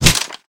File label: melee swing; weapons; Call of Duty Modern Warfare